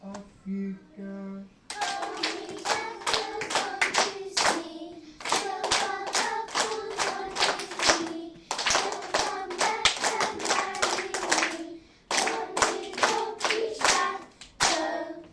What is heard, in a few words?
Bobby Shafto Clap